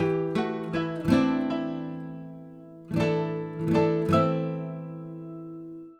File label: Pop; Google; NewWave Labs